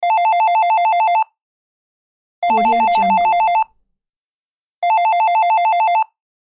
retro ring tone

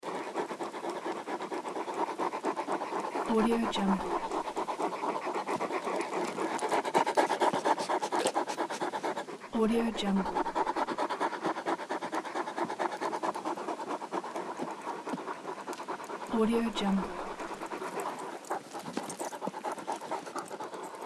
dogs panting loop

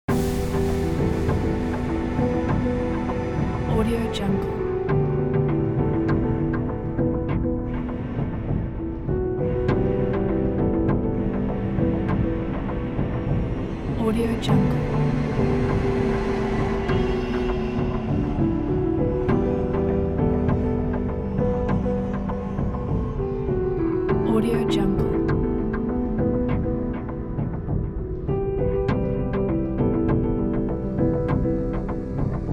the sad music

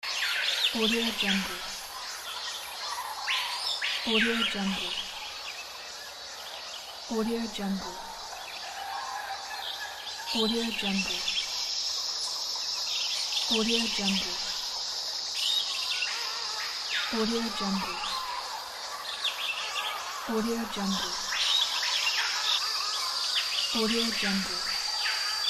jungle sounds

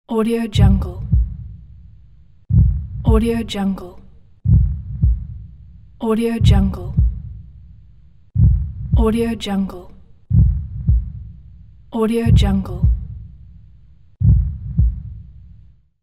heartbeat

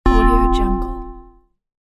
wrong answer sound

